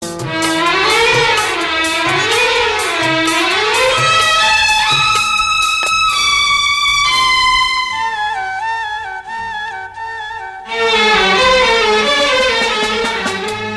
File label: Loud Tone